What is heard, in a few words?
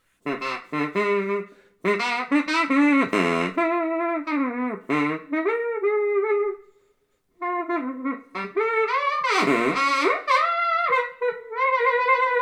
sax tooter